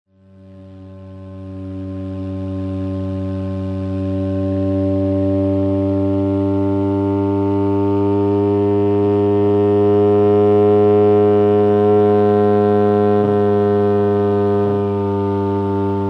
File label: dij trombone